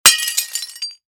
plate drop; sound ideas

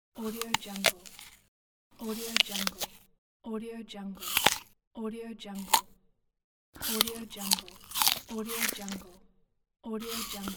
bite apple; alfom

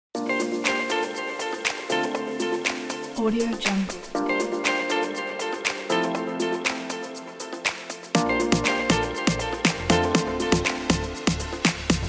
summer beach house

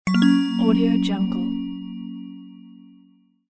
reveal positive; medusamusiclab

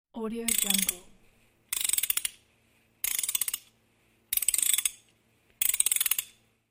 turn the nut

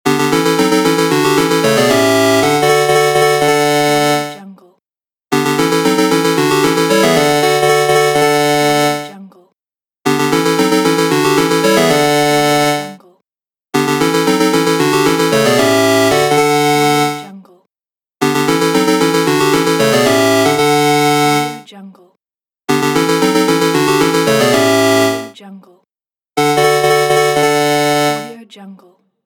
8bit level up; rawaudiolab

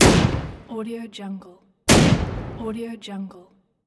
gun shots

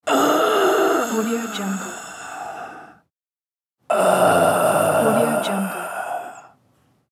last dying breath

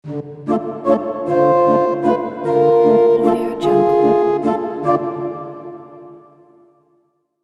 halloween circus transition

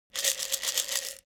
medical pillbox shake; foley; smartsoundfx

